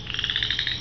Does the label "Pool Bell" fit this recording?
No